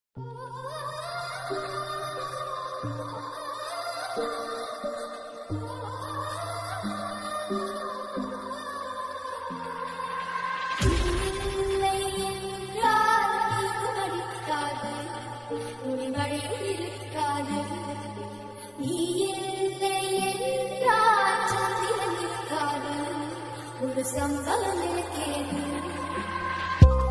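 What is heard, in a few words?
remix slowed reverb lofi song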